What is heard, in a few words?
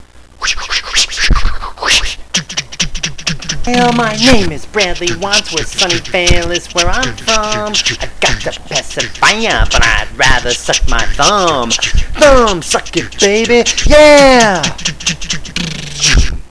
rap